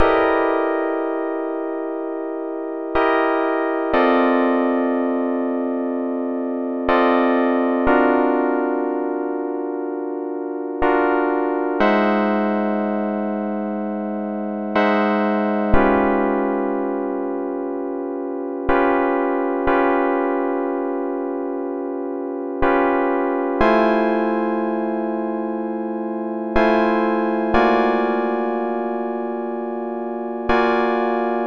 122 BPM Tonic F Dmaj Cmin Chord Progress; mmb chord progressions essentials

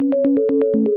122 BPM Arps A maj F min Tonic A; arp essentials

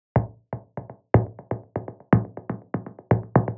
122 BPM Rythm Impacts; mmb drum arsenal vol 1

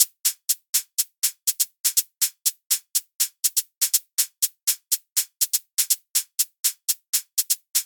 122 BPM Hat Hi Hat; mmb drum arsenal vol 1; Hi Hat Essentials

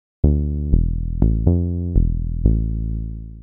122 BPM Bass Synth C maj A min Tonic E; Pro Bass Synth Studio Kit; MMB

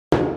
122 BPM Impacts; Flux; mmb studio melodic

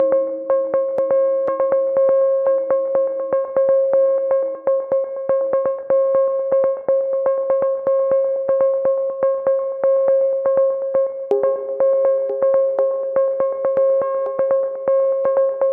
122 BPM Arps A maj G min Tonic C; arp essentials

